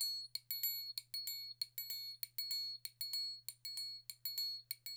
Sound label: NYC Percussionworks; Sampleheads; GJ LOOPS 96